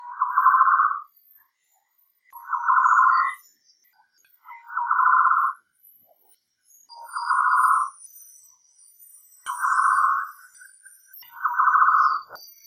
Green Broadbill calls, Kubah National Park Sarawak Borneo Malaysia